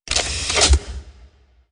枪上膛; Weapon

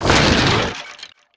木头堆散架

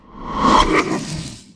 受伤, 鬼,幽灵; Enemy

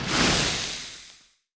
释放烟尘; Enemy

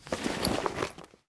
打开装备箱

